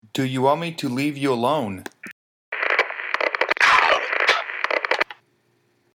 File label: EVP